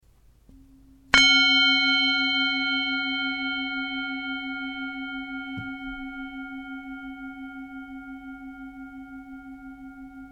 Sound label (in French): bol chantant